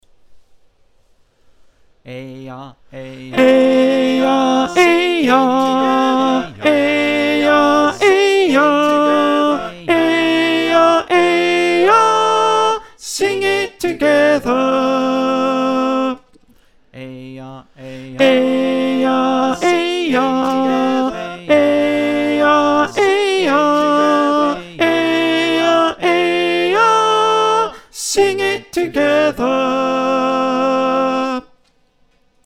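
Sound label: alto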